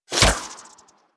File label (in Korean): 화살 날라가는 소리